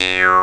jews harp D; E-MU Formula 4000 Series Vol. 5; Protozoa; Proteus3 Presets

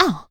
HIT, Jazz FX FEM PERC; Spectrasonics; Vocal Planet

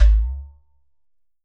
GHATAM

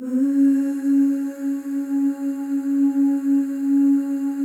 OOSC, FEM OOS, Voices; Symphony of Voices; Spectrasonics; FEM.2 OOS

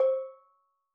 Steel Drums Layer C5, Calypso Steel Drum; Percussion

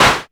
Axxe HC, ARP Axxe Drum Machines

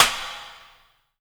A#3, JAPANI PERC; Northstar - The Wizard VOL-1

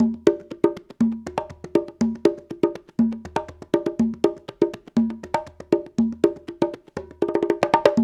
World Congas, 120

